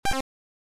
Title, Beeper; Rana Rama

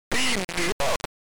Title AY Beeper; The Arc of Yesod

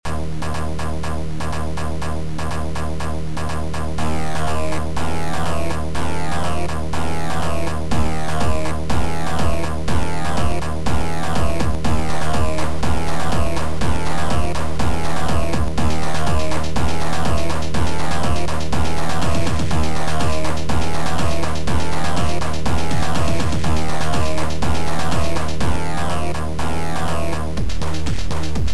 TECHNO

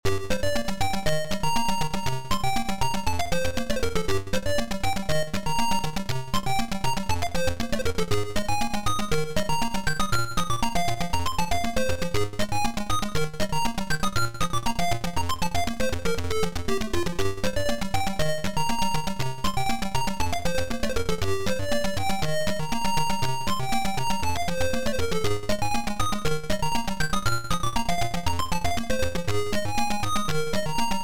Snare

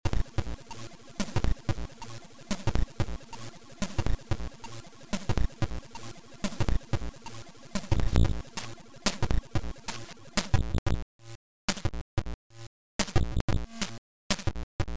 Noisedrm

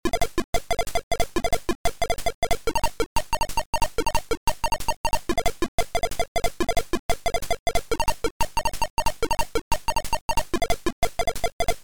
ChipNoise

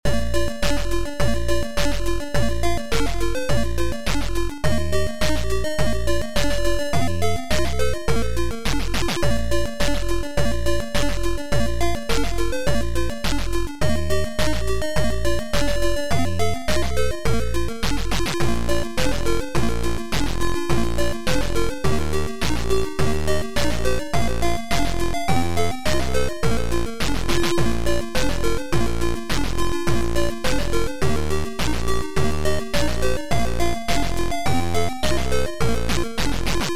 Bells; Equator

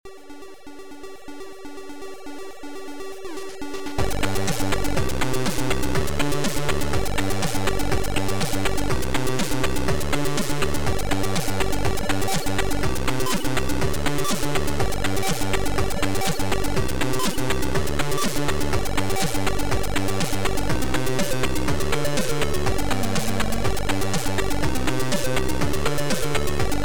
Piano

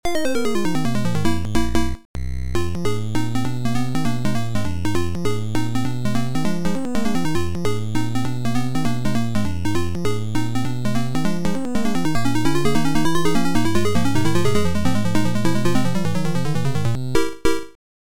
Jazzy; Justinas

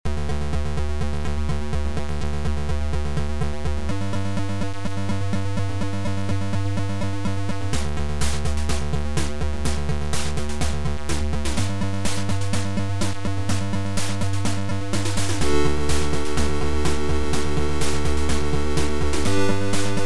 Game music; Wopgamma

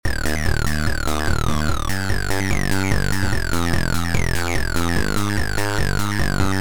BEAT

